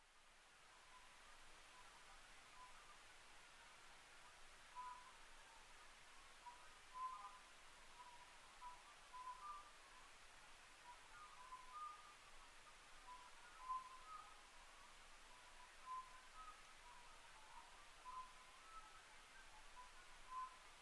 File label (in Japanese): konohazuku